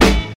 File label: good snare drum A key, snare drum tuned to the A note; snare-drum-samples